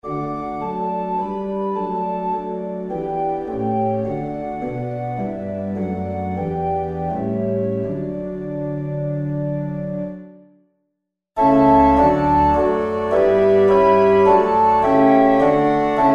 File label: Organ